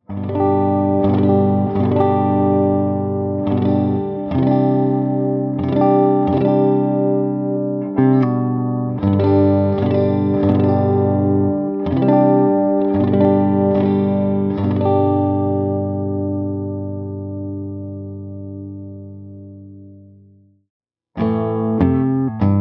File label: neck clean